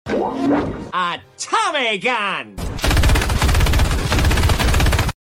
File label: Atomic Gun